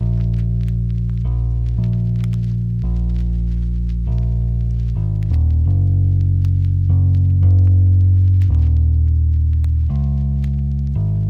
Classic Melody Loop - 85 BPM A# Min Bass; Melodics; Lofi; Loop Stems; Cymatics; Eternity

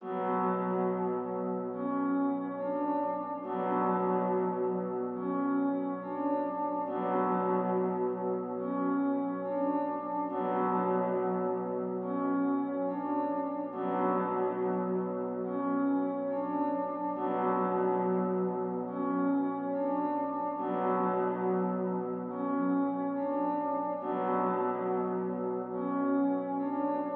140 BPM B Min Piano; Melody; Loop Stems; Cymatics; 2022 Melody Collection; Drill; Sacrifice

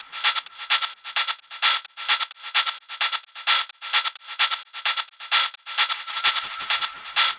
Hat Loop 130, Techno; Ghosthack; Free Techno Sounds 2024; Hats

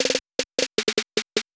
Snare Roll - 154 BPM; Drum Accents

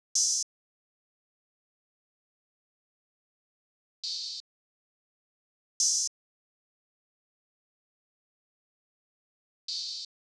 Drum Loop, 85 BPM Open Hat; Loop Stems; Cymatics; Vibes II Drum Loop Collection; Healing Drum Loop

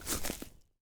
Footstep Grass Medium; Footsteps

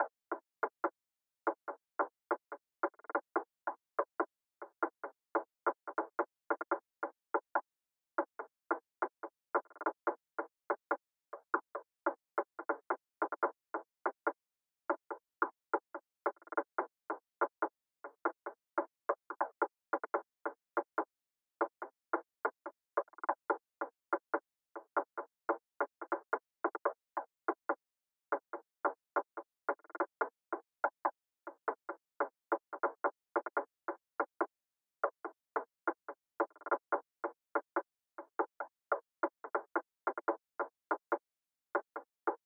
143 BPM D Min Perc, Loop Stems; Cymatics; 2022 Melody USB Expansion; Chaser

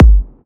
Techno Kick; Advent Calendar - Day 6 - Techno Kit; Kick One-Shots

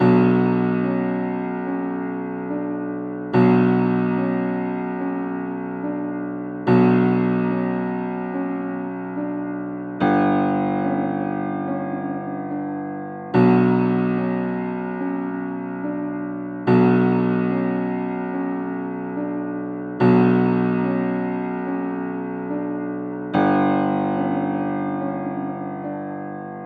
144 BPM B Min Piano, Drill; Melodies; Loop Stems; Cymatics; GHOST (Beta Pack); Break Out

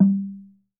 Log Drum - G; percussion; Cymatics - Infinity Beta Pack 2.0; Zeus Percussion Collection

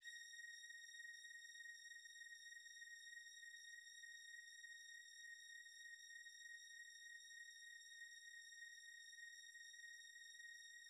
Classic Melody Loop - 88 BPM A# Min Strings; Loop Stems; Cymatics; Oracle Sample Pack

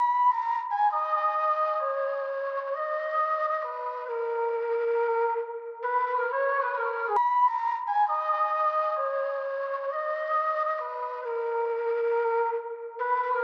Melody Loop - 134 BPM G Min Flute; Melodies; Loop Stems; Cymatics; Oracle Sample Pack; Oracle Various Melody Loop 10

